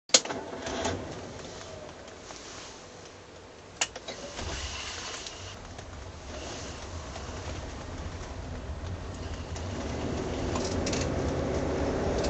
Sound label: bentley t1 drive